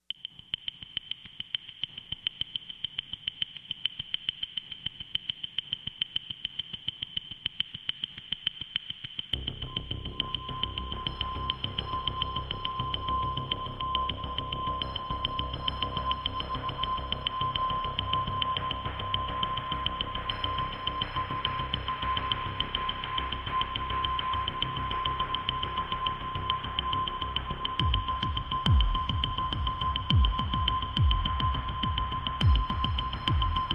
Documentary Soundscapes Light Serious Time Bomb

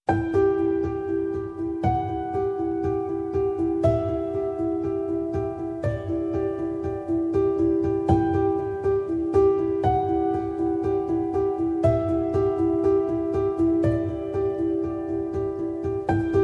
Documentary Soundscapes Light Serious

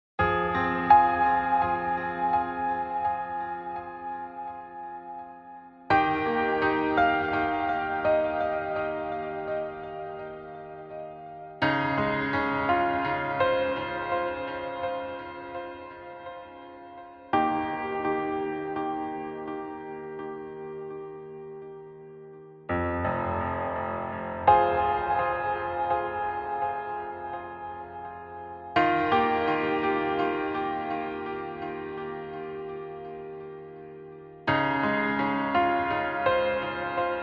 Piano Solo Version

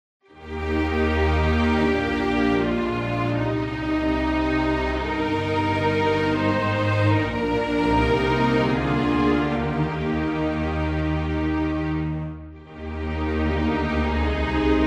Strings version; church music choir hymns

